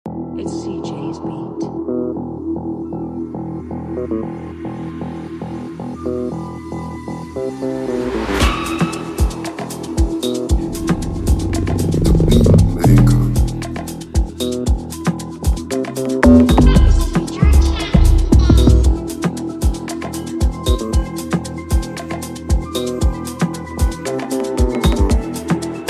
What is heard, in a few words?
ugopiano beat; Prod By ceejaybeatz